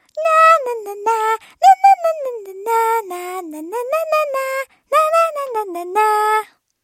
zvuk peniya

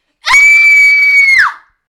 visg detskiy

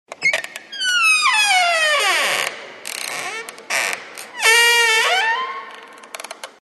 skrip dveri v koridore; открывания и закрывания деревянных и металлических дверей; Сборник звуков открывания и закрывания деревянных и металлических дверей

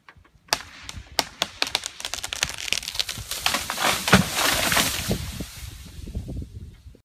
zvuk lomaiushegosia dereva

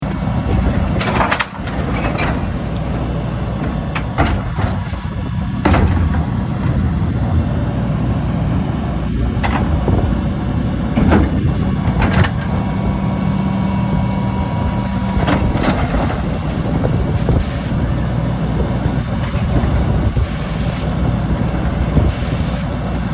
musorovoz

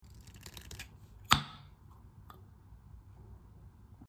zvuk otkrytiia kryshki banki

